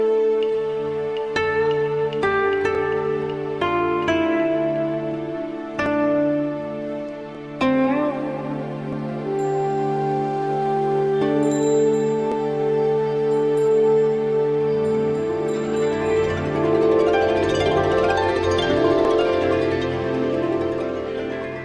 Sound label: relax chinese music ringtone